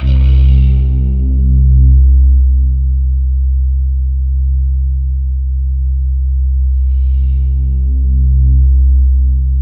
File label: C3, Synth Leads